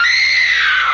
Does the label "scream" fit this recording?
Yes